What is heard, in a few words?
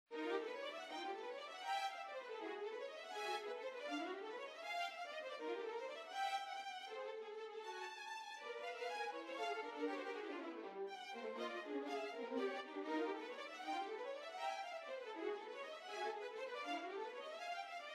Duo 2 violins